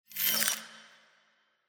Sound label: frozen Block line Win out; Champions of Valhalla